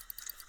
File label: fishing reeling